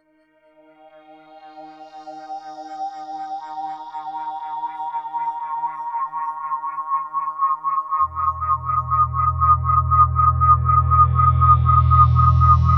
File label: Fizmo Pads